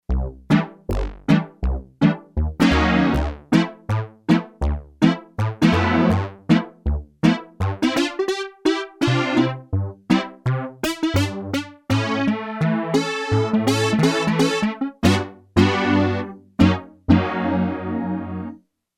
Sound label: Detuned Brass, Yamaha TX802; Performances; Factory Patches